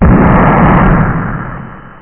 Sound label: EXPLOP